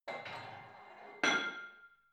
barbell plate put on hit ring reverby; sport freizeit; fitnessstudio

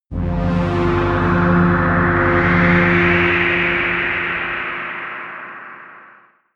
air trumpet wind, drones

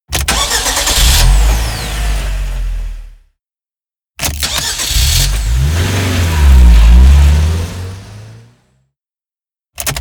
car car start

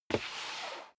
step sneaker wood creak slide long; schritte

